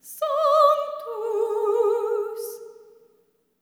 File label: SOP. TRAD, Symphony of Voices; Spectrasonics